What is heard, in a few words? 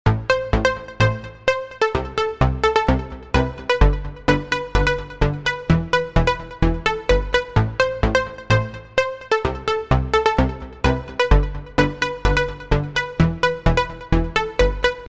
Trance